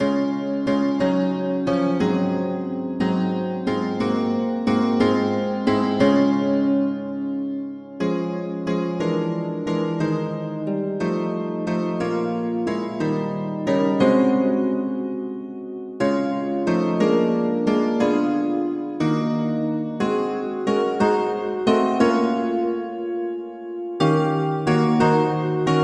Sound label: polyinstru; hymns; Himnario grande